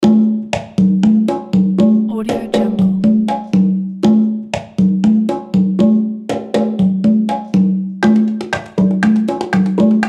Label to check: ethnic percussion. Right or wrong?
Right